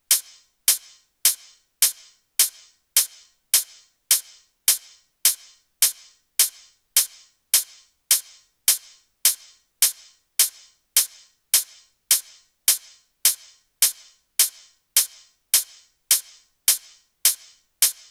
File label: hat 105, Drum Loops, Hat Loops; Datsun; Slate; MIDNIGHT Sample Pack